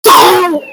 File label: kid get hit